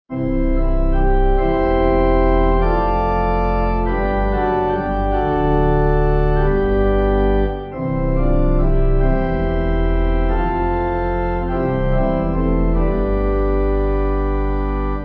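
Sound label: Pipe Organ; Morning Has Broken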